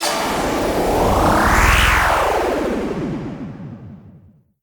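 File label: Big Win Crescendo And Initial Sound; starburstxxxtremeusa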